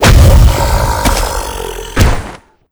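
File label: lava baphomet death, monster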